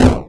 hit

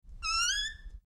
Creaking Door; horror; horror sfx vol 1

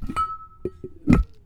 Cork Lid; BB Retail Therapy Sample Pack

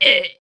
die; CC0 creature sfx 2

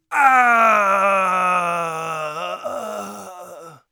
death, Warrior Voice; Warrior Voice Pack

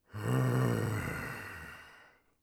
tired, orc voice; Orc Voice Pack

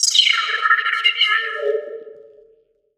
Cave Pan; FREE SFX PACK VOL 1

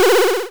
energy, square waveform; Square Waveform sfx

